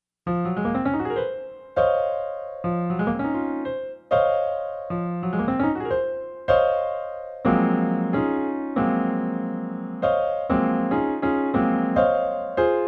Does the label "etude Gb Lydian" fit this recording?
Yes